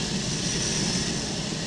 Fire Jet Loop; Zelda; OOT

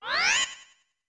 Warp Respawn In; OOT

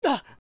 Adult Link Ledge Climb; Link climbing up a ledge

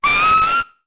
Shriek; Kotake; Zelda; MM

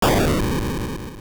Bomb Explode; A bomb exploding (also used for many other explosions, and the Slime Eel boss bursting through a wall)

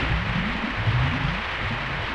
Water Temple Water Level Loop; Zelda; OOT

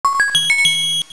Key Appear; A key appearing in a dungeon; Zelda

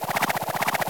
Fly; Peahat; OOT

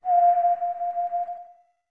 Notes Whistle F long; OOT

